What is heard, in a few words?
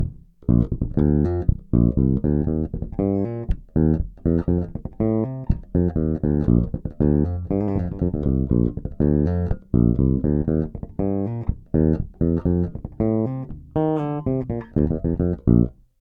Bridge Pickup Bass neutral Mid cut Treble neutral